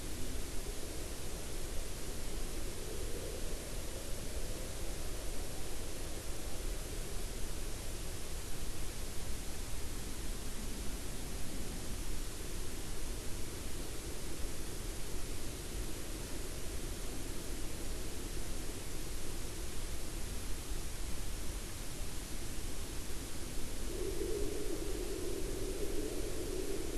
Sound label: WFR audio emfisis